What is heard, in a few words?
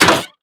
metal sliding door open; Oculus